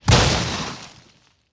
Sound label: excavator exit; monster within; soundmorph